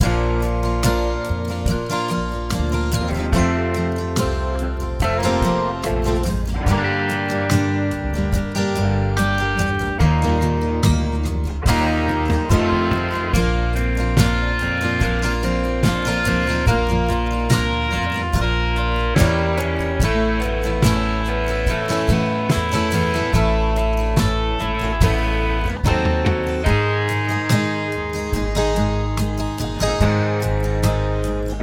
Bb Warmup; simplybrass; Warmup 2

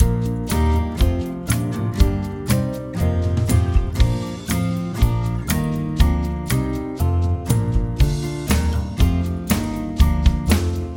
Bb C Major Scale; brass

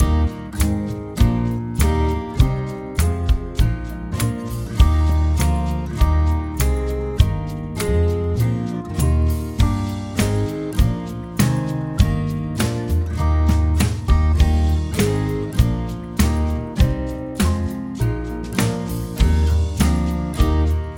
Eb C Major Scale, brass

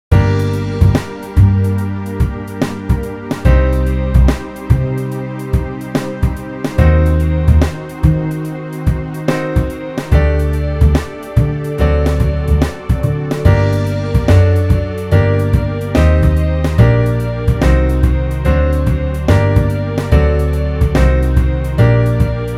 Bb A Minor Scale, brass

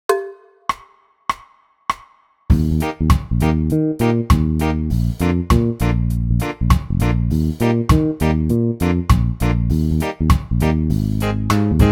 Eb Blast Off, brass

